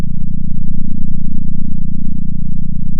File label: pop, sound of a small motorcycle